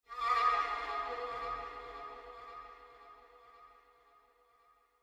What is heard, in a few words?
Jingles VINES OPEN; Semblance